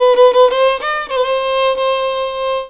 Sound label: VIOLON, Indian Traditions; USB Soundscan vol.45; AKAI